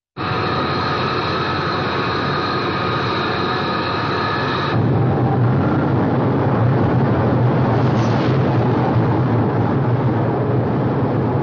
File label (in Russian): Pered startom v samolete